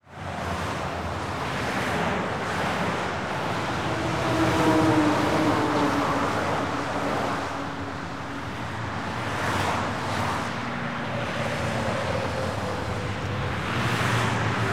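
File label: sounds of cars, traffic jams and car traffic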